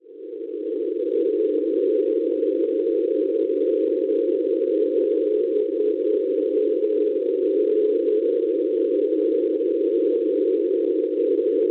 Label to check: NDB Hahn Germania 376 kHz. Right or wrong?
Right